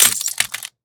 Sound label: pistol drop glass; STALKER; IWP